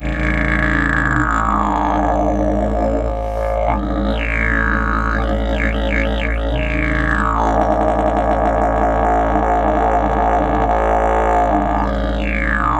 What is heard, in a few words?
A LONG DRONE, Didgeridoo; Big Fish Audio - Didgeridoo and Other Primitive Instruments